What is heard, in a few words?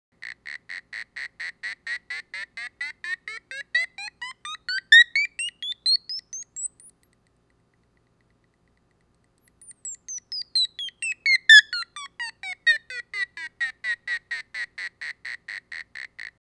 piezo sweep